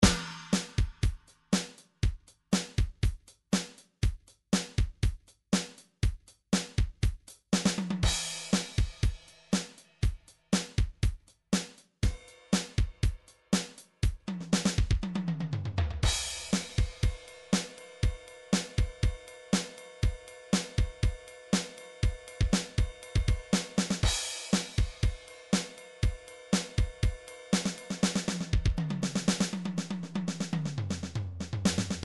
midi drums simple 16

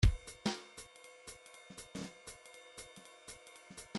midi grooves bebop

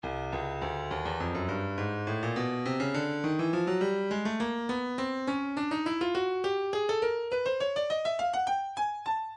breakbeat midi, 103 bpm; maximus d; Stylus Breakbeat Midi Files

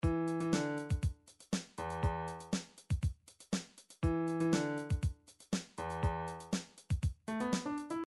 funk, midi

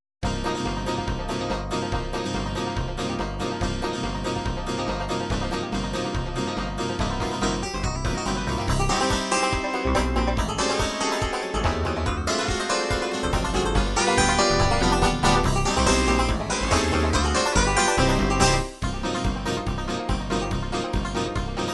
bluegrass, midi

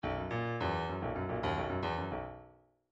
midi file grooves funk

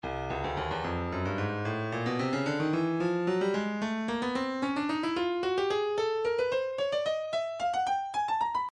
breakbeat midi, 111 bpm; Waggle B; Stylus Breakbeat Midi Files

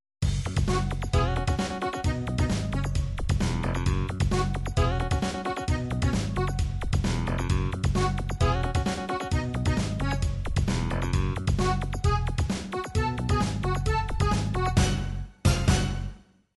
anime chacha

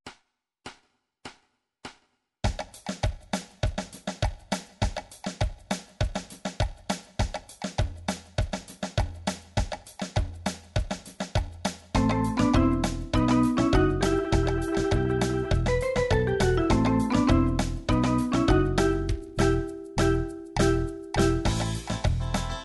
batucada, midi

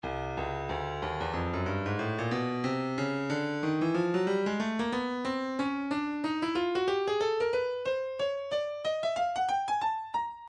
stylus breakbeat 092 bpm; check it a; Stylus Breakbeat Midi Files

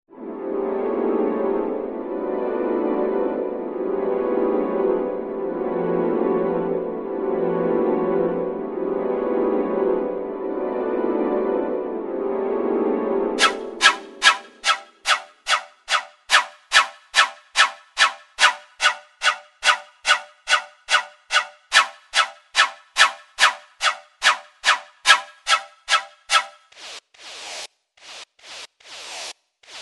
chord lines, midi; Midi Files 1000 Chord Lines

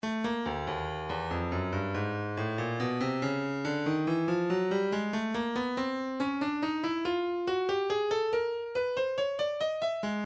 stylus breakbeat midi files 119 bpm; Rat Pack C

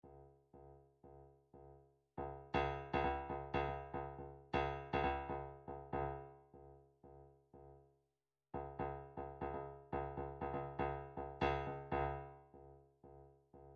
drum trax stic

